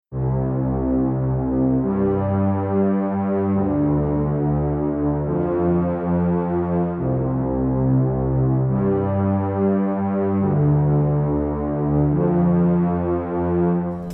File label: unprocessed synth